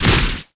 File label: weapon staff orb shot explosion